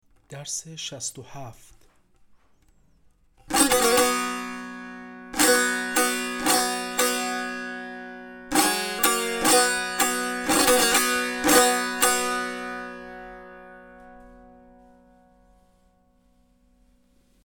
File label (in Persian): مشق تنبور